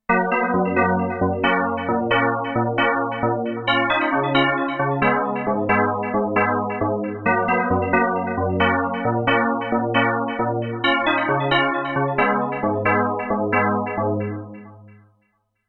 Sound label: Star Pluck; KORG Flare